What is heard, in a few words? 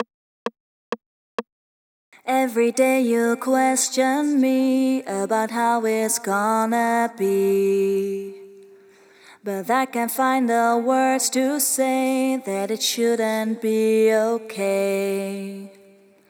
Verse 2 FX, 130 BPM